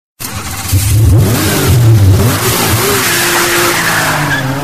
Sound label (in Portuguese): moto acelerando